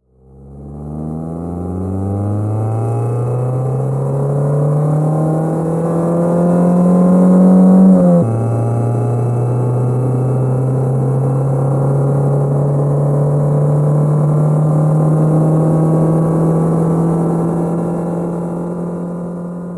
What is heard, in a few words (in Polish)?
odglosy z otoczenia jadacy samochod hamujacy samochod traktor samolot motocykl